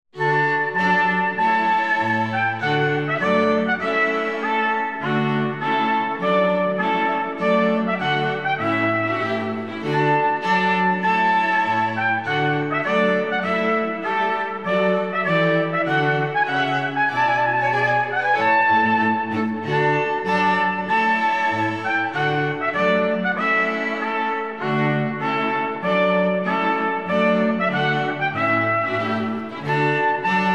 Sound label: Recessional Trumpet Tune